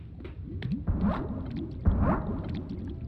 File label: walk underwater; We Were Here Forever